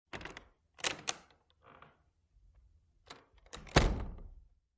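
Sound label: Door Open Close Close Up With Latch Nois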